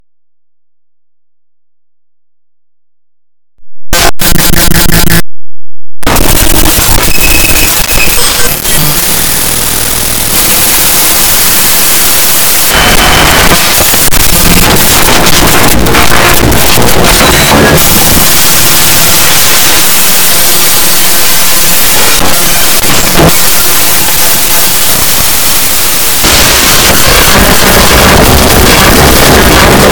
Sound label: noise